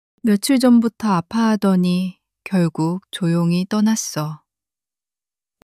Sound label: crying; monologue